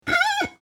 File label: Finger Squeak, Finger Squeak On Ceramic Plate; Wet Finger Squeak On Ceramic Plate , Quick; Squeaks; Objects